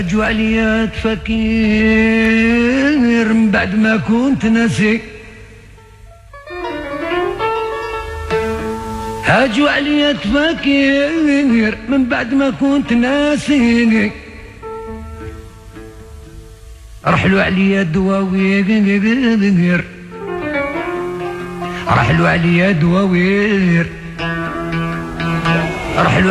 Chant arabe

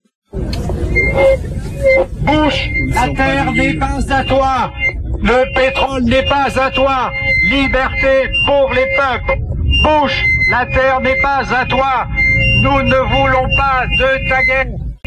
Irak manif anti-Bush

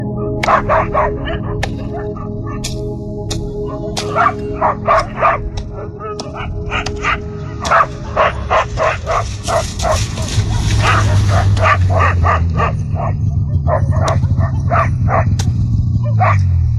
Campement Nenetse ambiance sonore

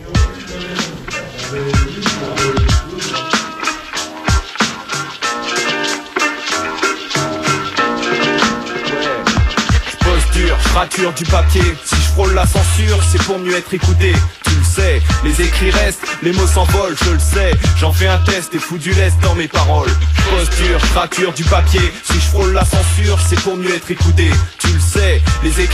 Chanson

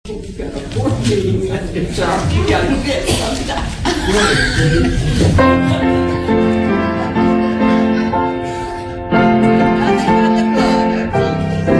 Chants religieux

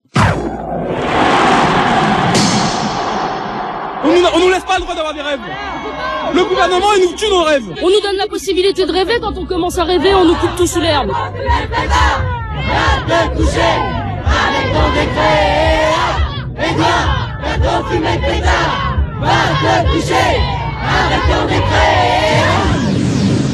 Manifs ambiances

